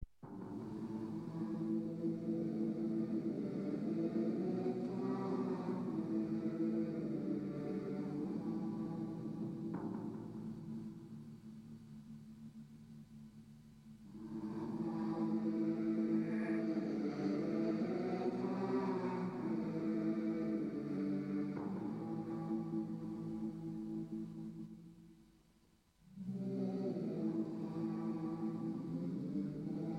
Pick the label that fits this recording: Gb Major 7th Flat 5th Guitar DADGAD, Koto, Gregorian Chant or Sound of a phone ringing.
Gregorian Chant